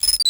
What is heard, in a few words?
frag grenade trigger